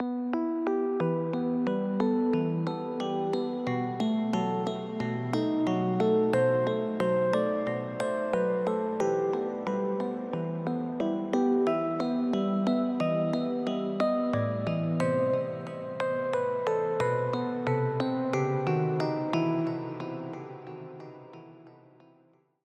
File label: Key